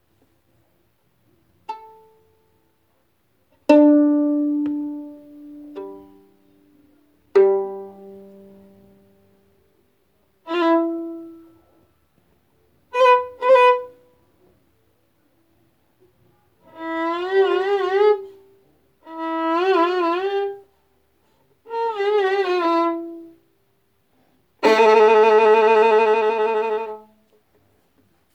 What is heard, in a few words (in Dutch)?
viool